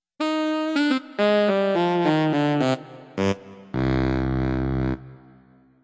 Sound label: Sax Downer; RoboTutor